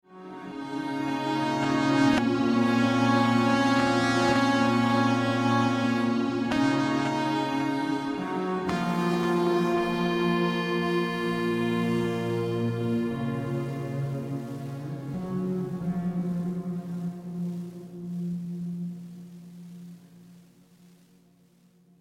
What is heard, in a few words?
Combat Stinger; State of Decay